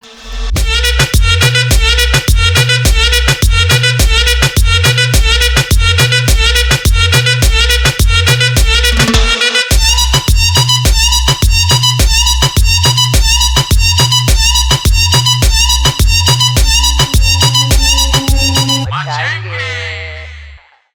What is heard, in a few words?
INSTRUMENTAL RINGTONE